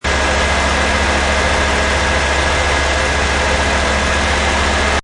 Truck Ford F150 Loop Rpm 2000 Engine Perspective, Ford F150 Truck seamless; Auto, truck, motor loop. Ford F150 Truck seamlessly looping sound file, engine running at 2000 RPM.